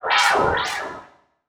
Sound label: Multimedia Internet CD-Rom Flash Animation